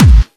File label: Clubby Kicks, Bassdrums